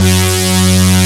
F#2, Moog Synths; E-MU Formula 4000 Series Vol. 3 – Analog Odyssey

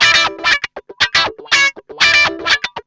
GUI 120 BPM

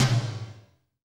TOM TOM, Roland

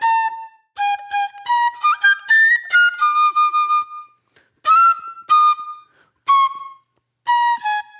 Shrill Flute; Sonic Foundry (Sony Creative Software); Manhattan Flutes

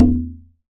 DARABUKA; WorldSounds

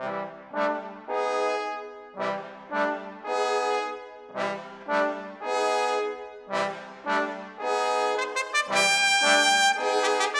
Brass

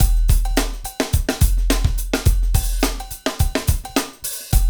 Hip Hop, Drumscapes Roland; Northstar

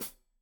Hat Foot, Hi-Hat, Cymbals Stereo

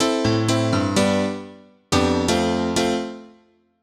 House Piano (125BPM), retro house

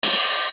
Hi Hat; Sonic Foundry (Sony Creative Software); Infinite Soul VOL-1

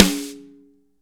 snare dry; Real Mega Drums

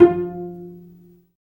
Vcs Pz, String Sections; Roland LCDP13

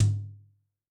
Brsh Jz Fl, Tom, Yamaha DTXpress-II

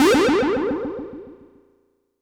Dub Aliens C; M8; Electronisounds; Synth Prophet One Shots

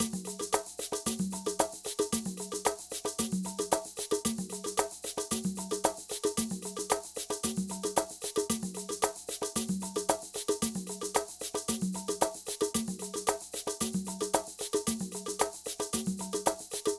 Ensemble, Latin Percussion

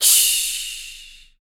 CYMBAL, MALE PERC

